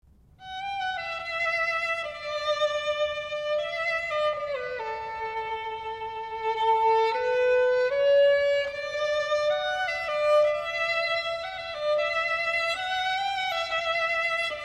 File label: Solo Violin